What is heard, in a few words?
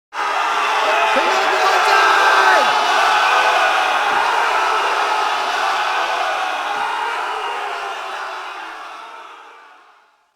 chant